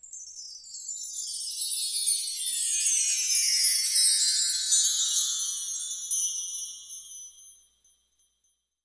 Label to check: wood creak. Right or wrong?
Wrong